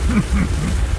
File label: miner dig loop vo